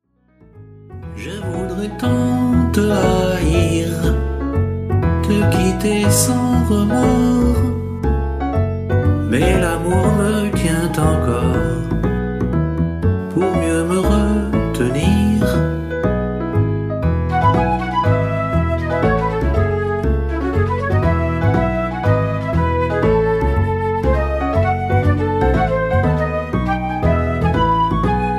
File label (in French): Chant